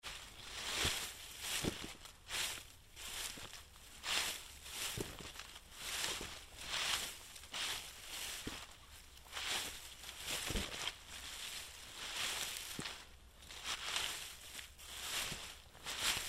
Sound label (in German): blaetter rascheln